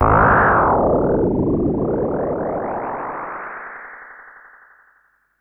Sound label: Classic FX; radiosonics; Classic Simple OSC FX